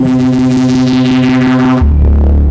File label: Technology Bass - 7A; Jungle; Blu Mar Ten; Jungle - 1989 to 1999